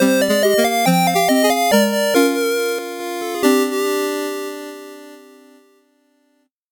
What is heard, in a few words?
8bit jingle slow